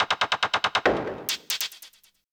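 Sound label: SNARE ELECTRO 140, Techno Drum; Techno2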